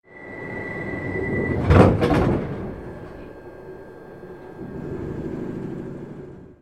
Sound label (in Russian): v stoyachem vagone metro zakrilis dveri